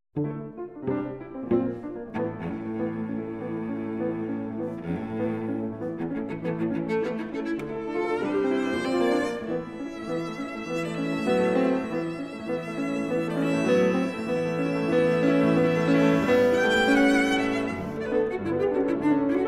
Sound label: Cello Sonata in D Minor, Final. Animé, léger et nerveux; Debussy; CD 144, L. 135 III.